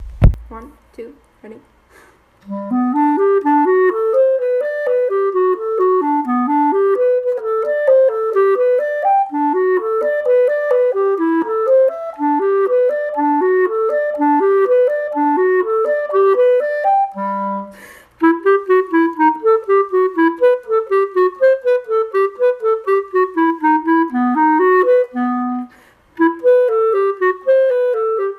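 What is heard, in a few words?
bassoon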